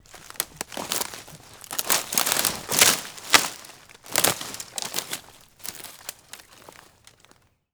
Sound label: Footsteps Forest; Haunted Bloodlines; SFX Producer